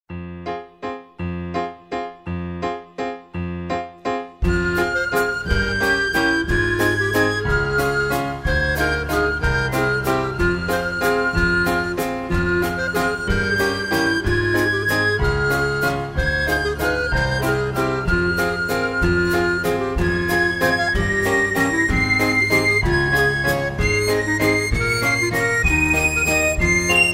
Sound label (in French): valse